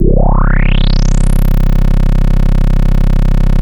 Anasound Long lo C, Industrial Analog Single Notes; Sonic Foundry (Sony Creative Software)